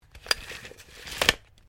TAPE MEASURE METAL EXTEND RETRACT, Common metal tape measure; Hand Tools; SoundEffects-Foundation4